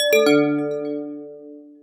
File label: system power off; BlackBerry OS-10